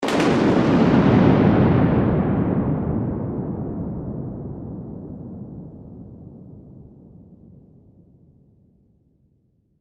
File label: Weather Thunder Clap